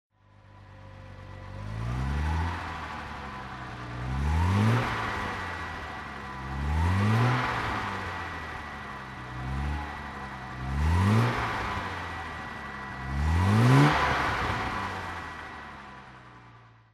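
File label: jag S type 38aut throttle, Engine sound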